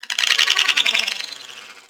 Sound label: Beep Stutter; Warframe; Corpus